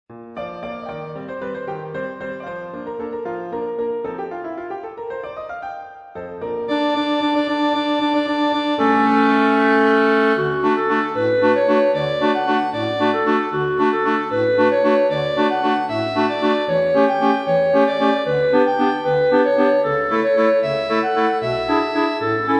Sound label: Thank You Gallant Gondolieri Chorus Cue Tutti; gondoliers